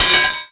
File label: bouncy metal beam hit; bouncy objects; Tony Hawk's Project 8; XBOX